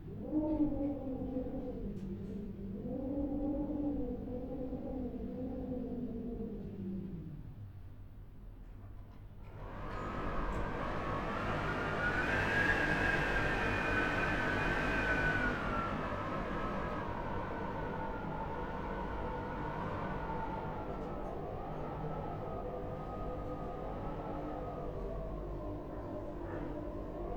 Wind elevator shaft; urban and suburban; boom library

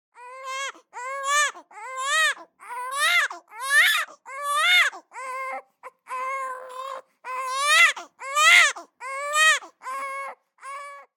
BABIES SCREAM Boy 3 months Increasing; Baby Boom

